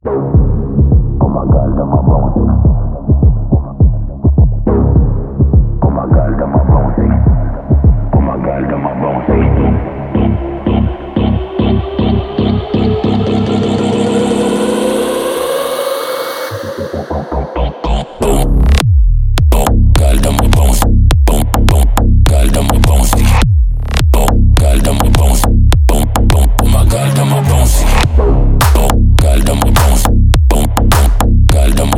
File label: Bouncy Bass Test